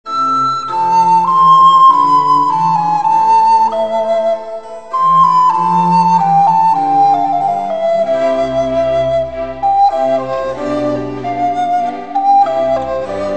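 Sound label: Recorder orchestra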